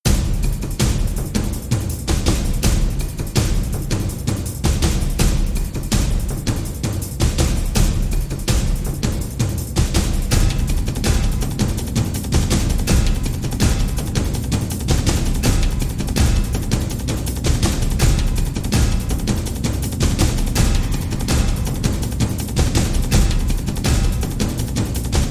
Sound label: loose cannon drums